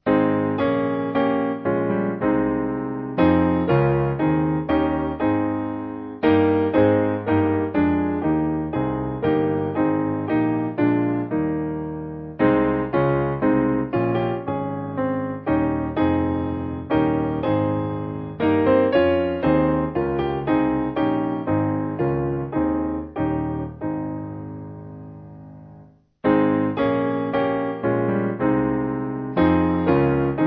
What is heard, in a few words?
Piano